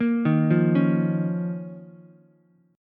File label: D sharp 7th Suspended 2nd D sharp, Ukulele; arpeggiated; D#7sus2; JGuitar